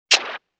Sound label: Static Hit; Vintage Cartoon